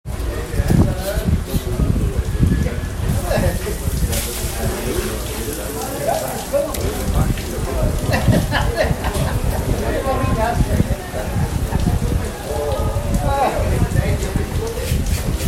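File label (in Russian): чистка рыбы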